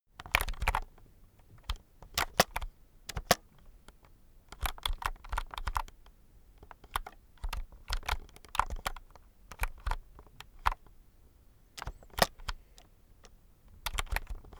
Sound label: keyboard external game sequence long